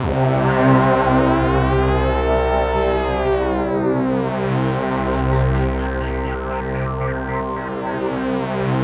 SYN K-2000, Roland

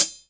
HH, Drum Dry; East Collexion; Drum 1 Dry

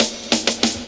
Drum Roll; Zero-G Jungle Warfare 1, 2 & 3

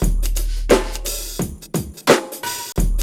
BEAT, Hip Hop

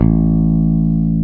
E.BASS

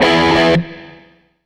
SG Guitar Loop

